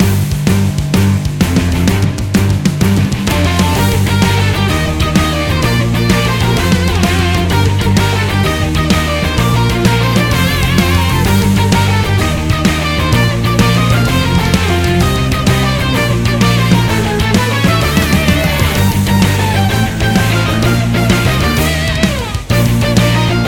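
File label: Battle on Sandy Shores Rock, background music; cookie run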